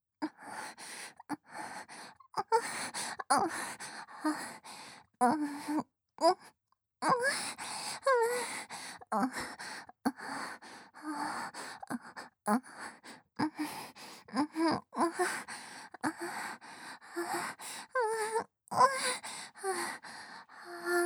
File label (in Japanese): 喘ぎ声・普通・右耳